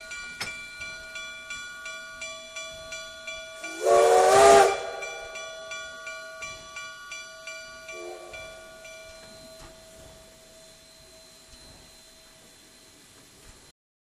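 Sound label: Steam Loco Bell Whistle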